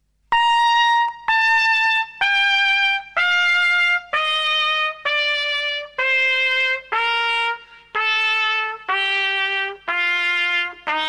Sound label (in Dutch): Koperblazers trompet van hoog naar laag